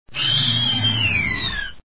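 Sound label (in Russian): звук визга девушки убегающей от монстра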